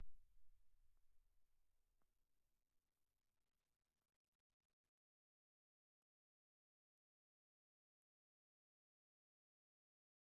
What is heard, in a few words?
Real perc Phrase; Worms Rumble; PS4; Xbox Series X/S